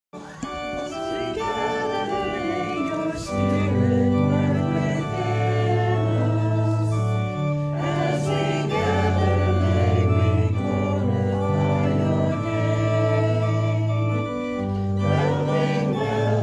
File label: Gathering Hymn